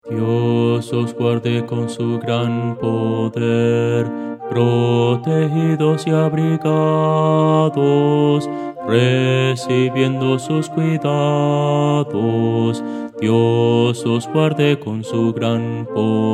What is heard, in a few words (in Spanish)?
contralto